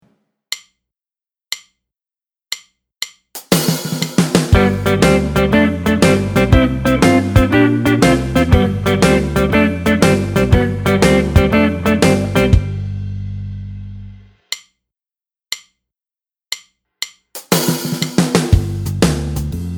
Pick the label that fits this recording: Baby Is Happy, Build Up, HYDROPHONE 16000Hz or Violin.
Build Up